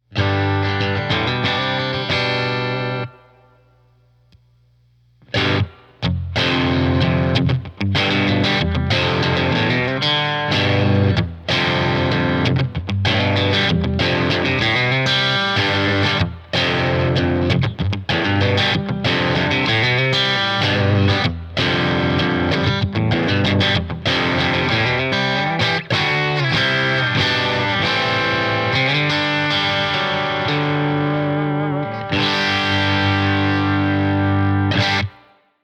Low Drive and a medium Output Level push the amp into pop Americana territory.; Philly Hoosier Dues